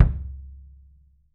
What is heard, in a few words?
kick, 3D Drums; RUBB KICK